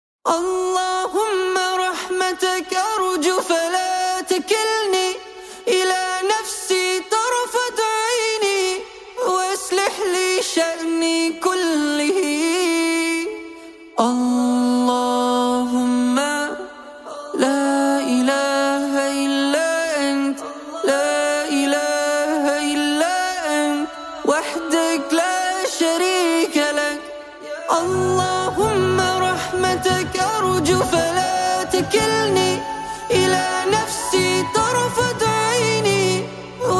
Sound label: Beautiful Nasheed Allah Humma Siedd Vocals Only